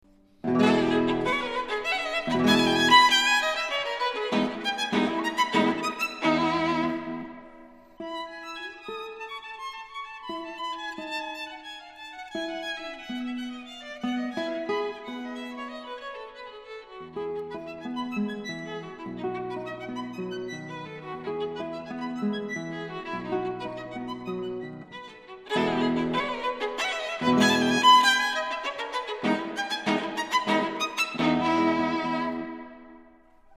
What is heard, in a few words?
for violin and guitar; paganini